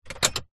vehicles car wiper stick switch move flick toyota rav4; zapsplat